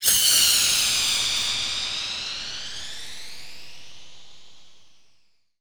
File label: Mini Rocket, Flyers; Zero-G Seismic Frequencies